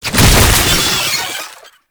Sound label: Infested Mercy Shotgun Face Hugger Latch, Weapons